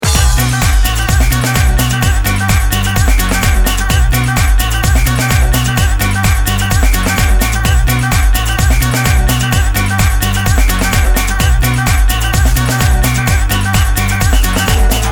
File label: samba mix